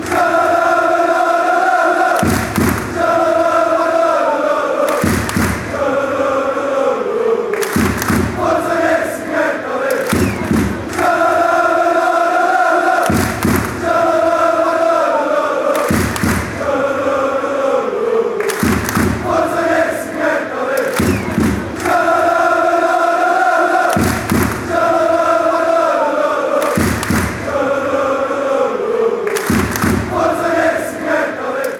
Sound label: schalalala forza racing, fanchants